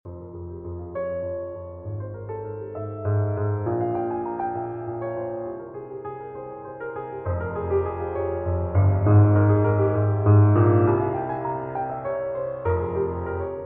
Invention in F Minor

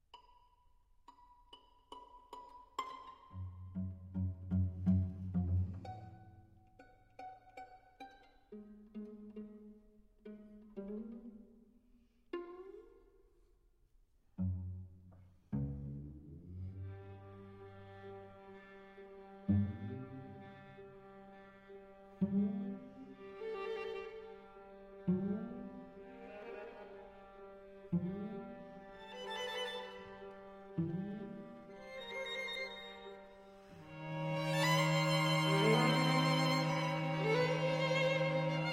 String Quartet in B-Flat Andante

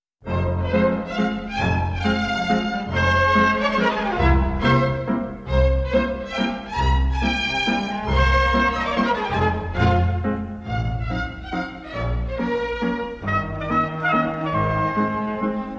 Mazurka

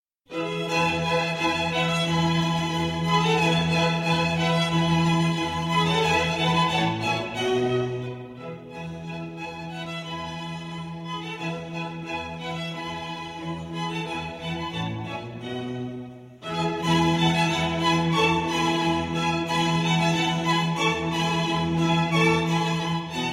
Violin Concerto in E Major, Allegro; The Four Seasons, Violin Concerto No. 1; RV 269; Spring I.

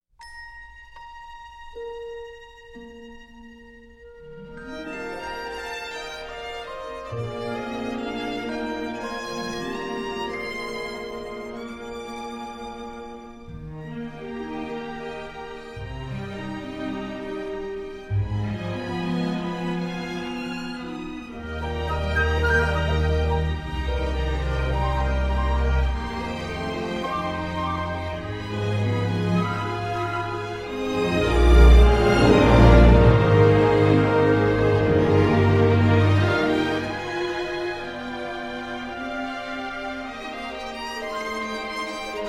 Instrumental

